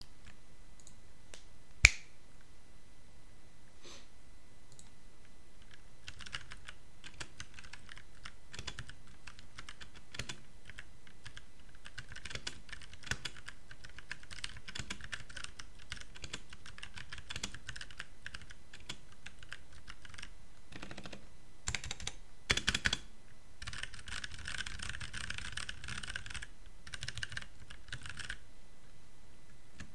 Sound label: k duck, keyboard; build your own keyboard